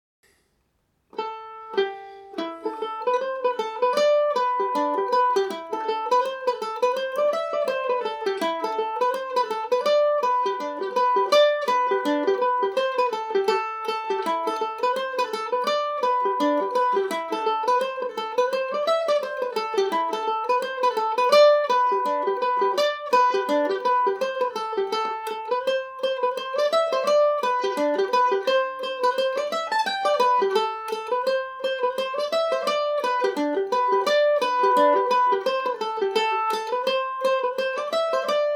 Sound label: ador, mandolin; G&O #34 mandolin